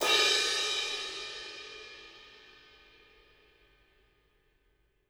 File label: crash, crashes